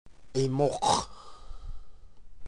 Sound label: wheel